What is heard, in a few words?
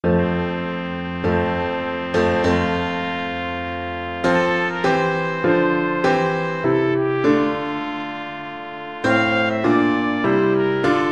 March D trumpet; Handel